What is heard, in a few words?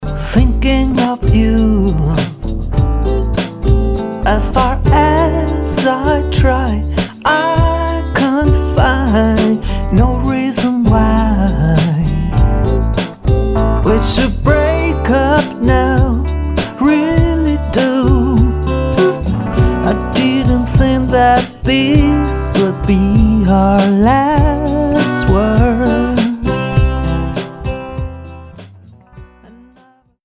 Breath